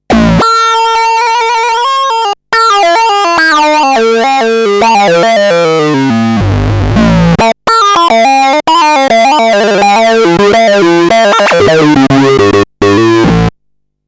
Lead Sync

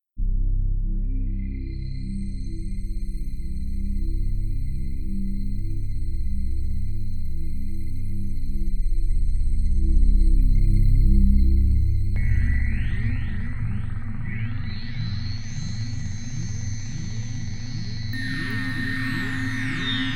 noises

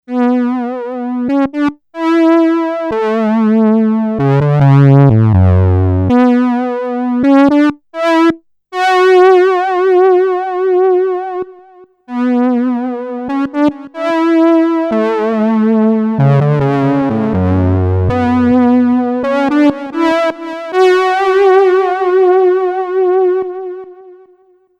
matrix saws

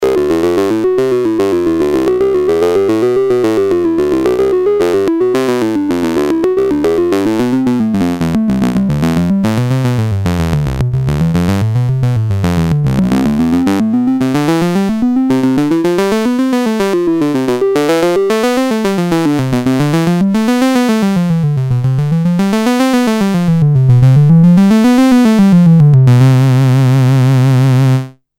unstable

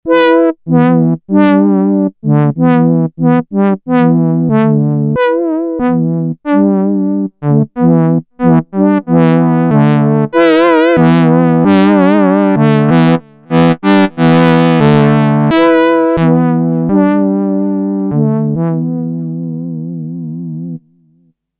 flugelhorns

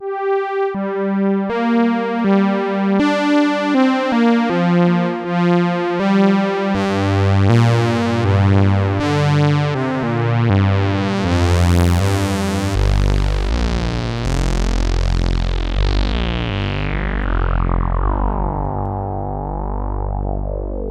Pro One Saws

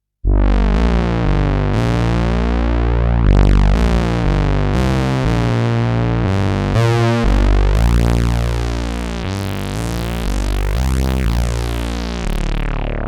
Bass

